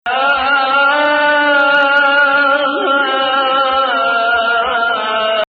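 Hijaz Adhan Hijaz flat 5, Adhan (call to prayer), lowered 5; more typical for this to occur as ending within maqam Hijazkar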